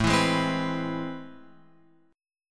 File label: A Minor Major 9th, down, Guitar, Open F; strummed; JGuitar